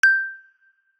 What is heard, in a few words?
ding, notifications; OnePlus 5T Stock Ringtones